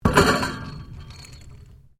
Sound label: MECHANICAL CLANK RUBBER METAL; Variou smechanical sounds; Clanks (Sound Effects:Objects)